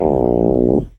Stomach Growling

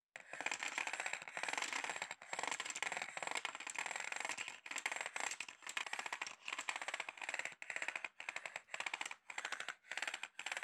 Tow Cable

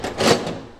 Latch

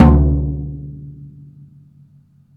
Floor tom

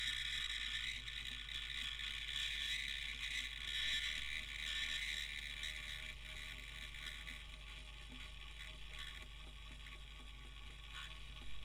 Motor Noise

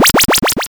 Time Warp Effect

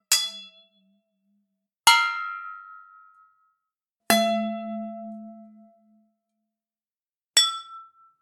Donk Metal Glass

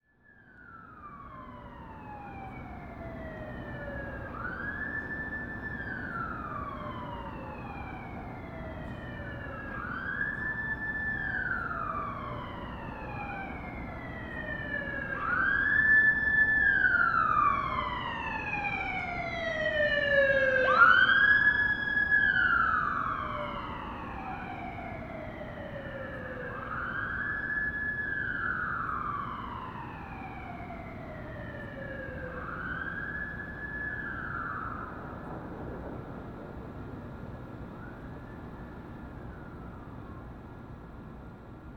Sirens

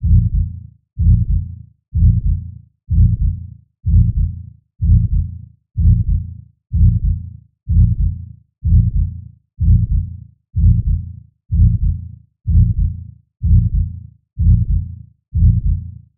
Loop Simulated heartbeat Normal

